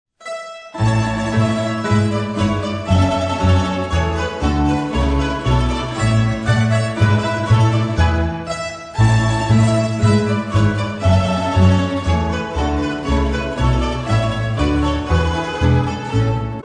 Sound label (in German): Marsch, mandolinenorchester